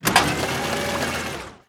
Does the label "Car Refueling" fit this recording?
Yes